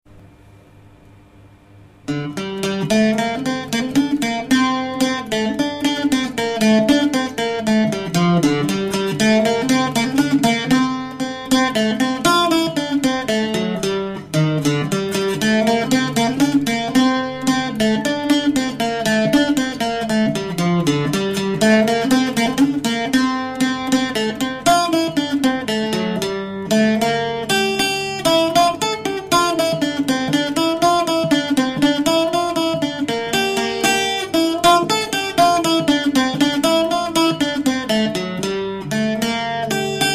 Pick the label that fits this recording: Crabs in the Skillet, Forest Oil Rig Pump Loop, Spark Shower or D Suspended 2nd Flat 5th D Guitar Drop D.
Crabs in the Skillet